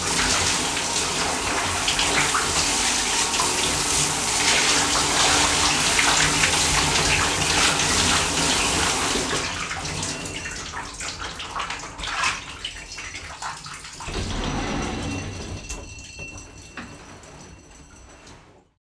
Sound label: shower